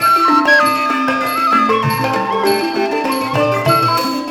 98 THAI, THAI ORCH PH; Spectrasonics - Heart of Asia CD2

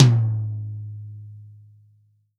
REAL DRUMS POP KIT

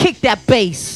VOX GENERAL; AMG Megabass REMIX! VOL-2

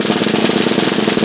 SNR CNCERT

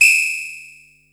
whistle, Yamaha Motif ES drum machine; 300 Drum Machines; Yamaha Motif ES-Series

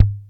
Drum, dry perc; Quasimidi Rave-o-lution 309

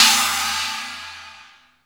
STER PHAZE, CYMBALS; 90 s Sample CDs; Back In Time Records; Big Bang

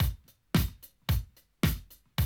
Classic DrumLoops, 110

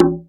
Dance Drums; Best Service; ProSamples vol.15; AKAI

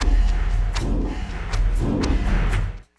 Elektro Thud Shake, Drums; Sonic Foundry (Sony Creative Software); Friendly Fire; Marc Steve

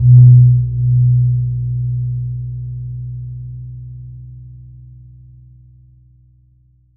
GONG B1; USB Soundscan vol.60; Asian Traditions; AKAI

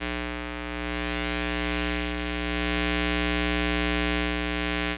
Electromagnetic Fields

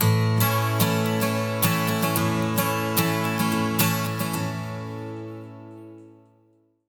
110 MAJ 12 STR, Acoustic Guitar Loops

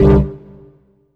STAB Sine Lead; Polyend; Plughugger

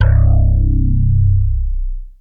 Big Synth Basses

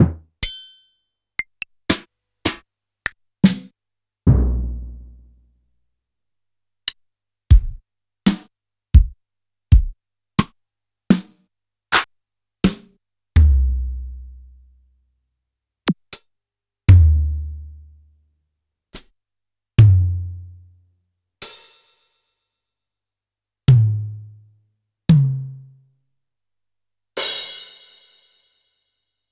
Yamaha PSR-E323 Standard Kit

